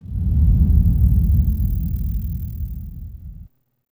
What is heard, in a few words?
interface enter echo